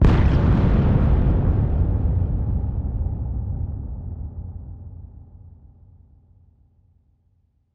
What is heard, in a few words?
bomb